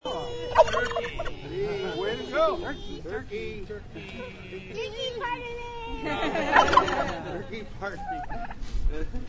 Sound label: turkey